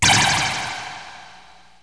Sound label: BULLET; 枪炮声音素材